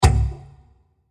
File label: Short Plastic Stab With Reverb; Miscellaneous Impact Sound Effects